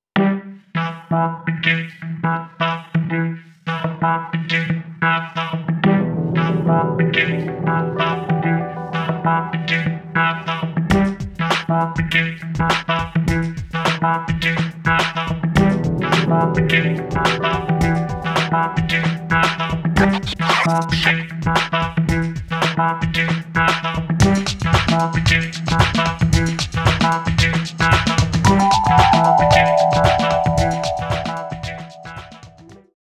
mpc5000 stretched downbeat; akai

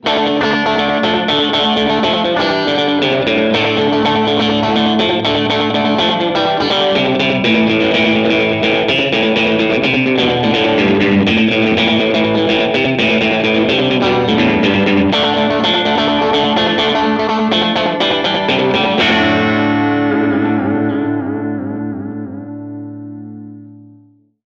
slap, delay; tc electronic nova

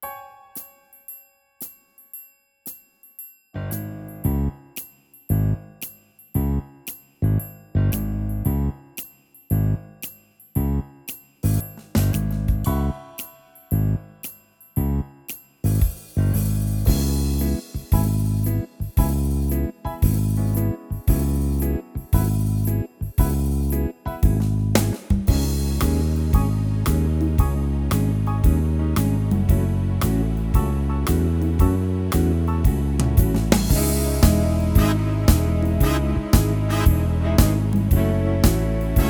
style movie and show, yamaha tyros3

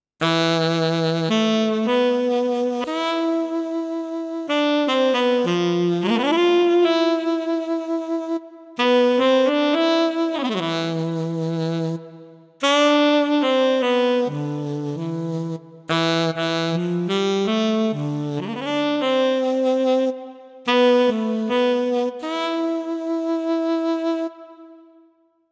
sax, yamaha tyros3

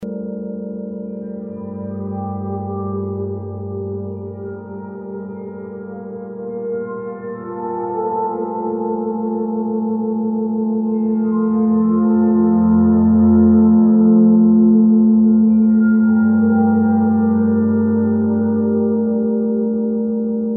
ghost chimes; best service galaxy piano 2

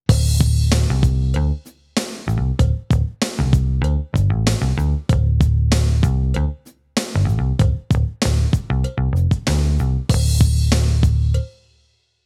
son ebass; korg

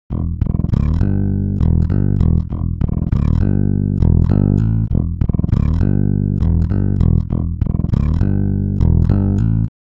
bass; ikm sample tank stretch

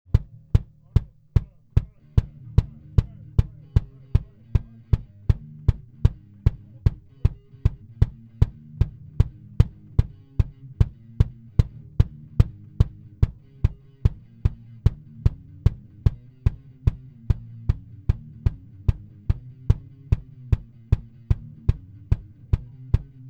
pad

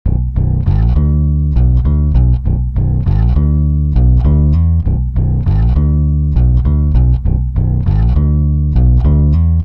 bass; serato pitch n time pro

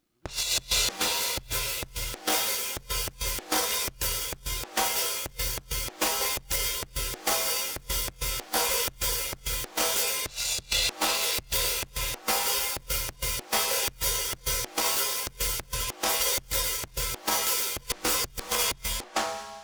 drum

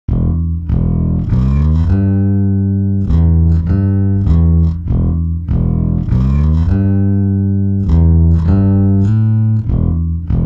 bass; bias peak; timestretch

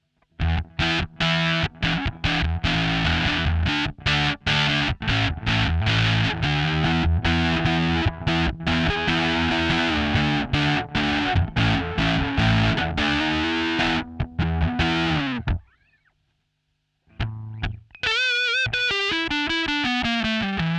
vintage distortion; behringer pedales guitare

